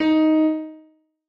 noteblock d sharp 2; mesecons

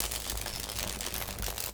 arcing; dfcaverns; underworld items

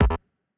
cannot

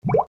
noteblock bubble; mesecons

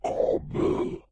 mobs dungeonmaster; mobs redo

